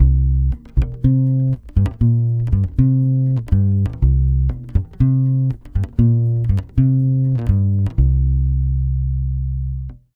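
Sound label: 120 BASS C minor, Brazil Bossa; USB Soundscan; AKAI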